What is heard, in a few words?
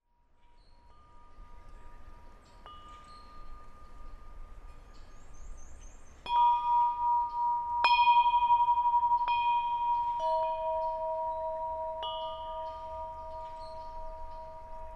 Wind Chimes Chimin'; Wisconsin 2019